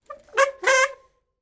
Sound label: transport bicycle brake squeak